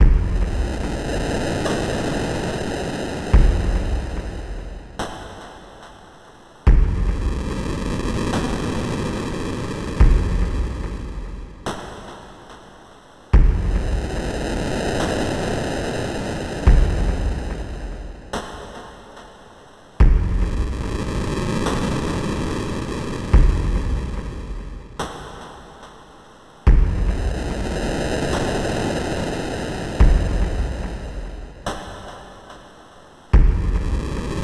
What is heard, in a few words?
Soul Pylons Drum only; Tiny Rogues; Moppysound